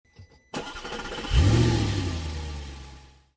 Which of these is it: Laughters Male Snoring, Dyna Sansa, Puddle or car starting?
car starting